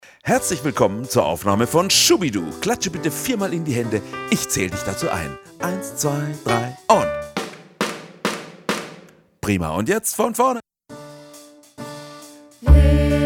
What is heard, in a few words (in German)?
Playback fuer Video - Chor